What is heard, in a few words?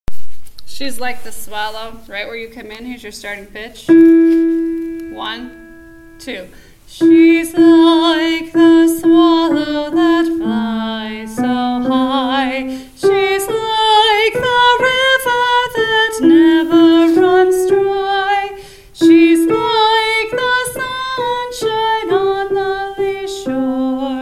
shes like the swallow med low